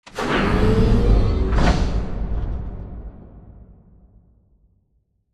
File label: Robot Arm Pass Passer Close; Boomtown; Titanfall 2